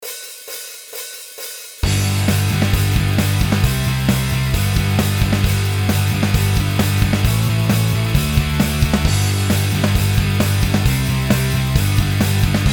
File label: Rock